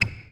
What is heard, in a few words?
Basketball Squeak